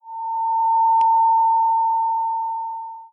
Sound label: Security Scanning